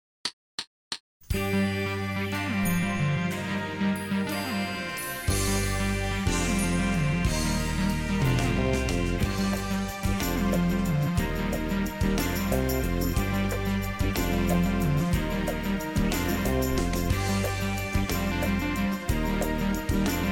bell carol, playalong

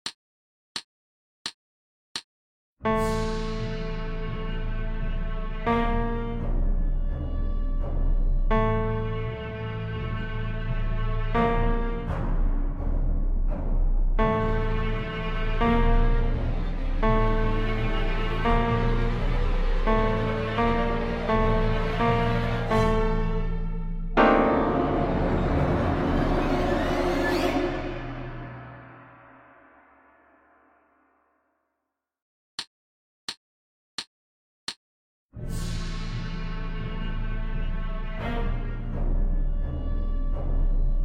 shark attack 86 bpm

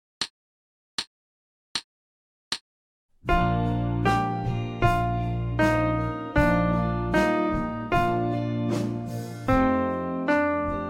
78 bpm

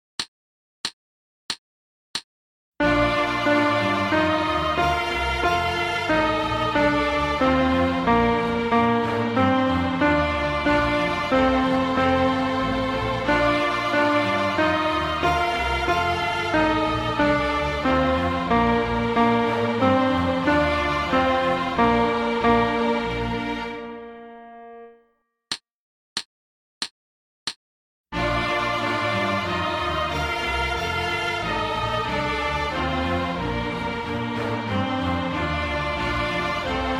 92 bpm

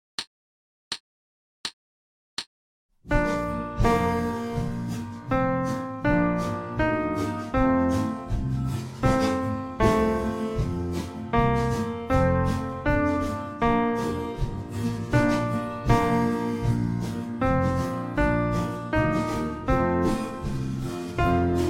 82 bpm

